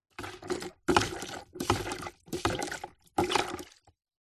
industrial pump hand plastic small pumping water plastic bucket